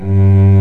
SOLO STRINGS

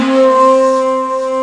SHAKUL C2, ethnic; Rubber Chicken Software Sample Bank

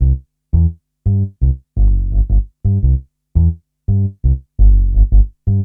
Upright Bass, Drum & Bass; Fluid Dynamics

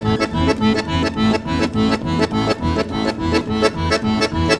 ACCO, Gipsy; Best Service; Ethnic Journey Sound Cube

